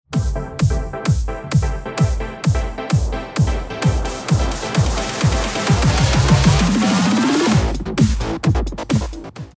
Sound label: snare pitch